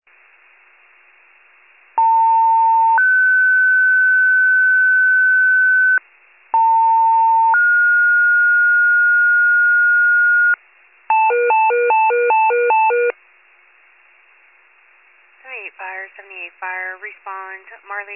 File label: fire, pager alert